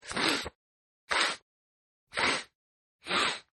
Man Sniffle, Man Sniffles; Man Sniffles-4 Takes; Sniffling; Human

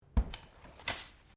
Umbrella Close; Umbrellas (Sound Effects:Objects)

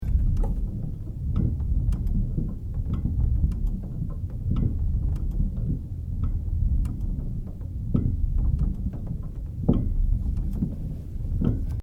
PLAYGROUND ROUNDABOUT ROTATING FAST LOOP; Various playgorund equipment; Playgrounds

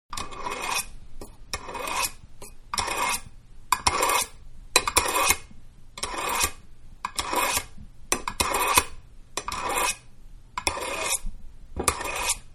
Small Knife Sharpened; Knife-Being Sharpened-Small; Kitchen; Household; Manhattan Production Music

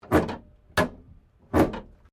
WRECK MINOR CREAK, Wrecked Cars; impacts, crashes, scrapes; Crash Sound Effects; Crashes (Sound Effects:Objects)

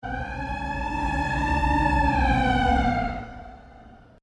Created Cyber Whale Call, Alien Sound Effects

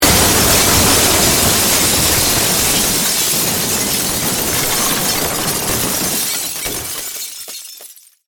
Crash, Wood, Glass; Wood Sound Effects; Materials

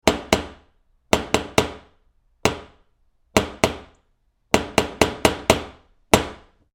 Knocker on outside door, Door knocker metal; Various knocking; Miscellaneous Door; Tom Hutchings

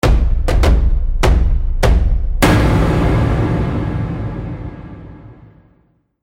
Radio Stinger

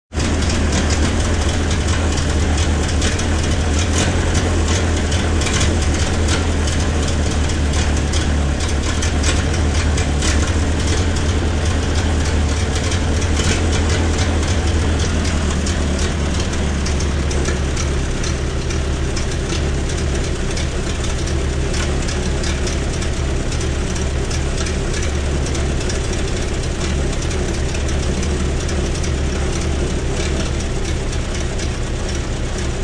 Model T Idle, Authentic 1931 Model T idling.; Car Sound Effects; Vehicles

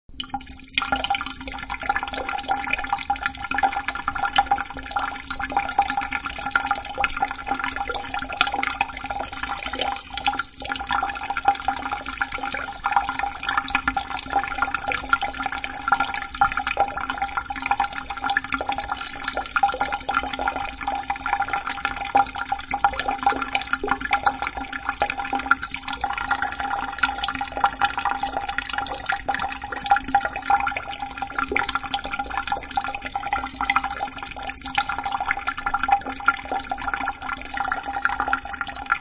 Sink Running Water Close Up; Manhattan Production Music

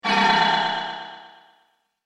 Resonating Alien Impact

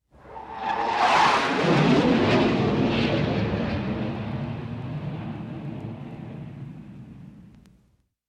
Jet Fly By; Vehicles; Jets; Bobby Cole; recorded in a custom built sound booth

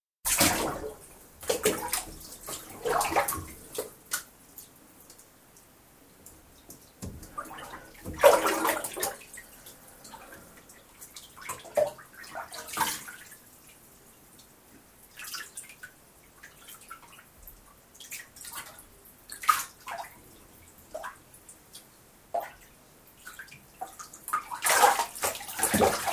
Bath, someone having a bath; Water; Water Sound Effects; Water (Sound Effects:Nature); BBC

